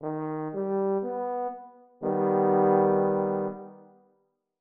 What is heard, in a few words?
bass triad aug Eb